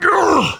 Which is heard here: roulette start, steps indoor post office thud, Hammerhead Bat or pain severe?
pain severe